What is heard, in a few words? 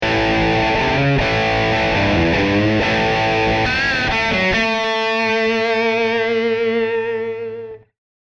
Rock n Roll